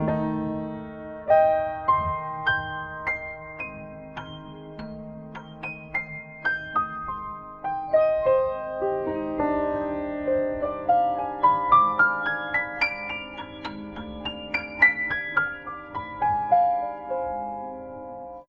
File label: jazz keys